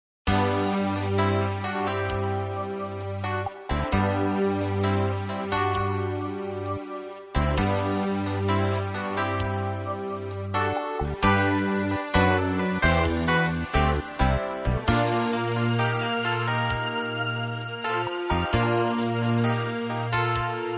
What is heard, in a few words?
Easy Listening & Classical; Sound Ideas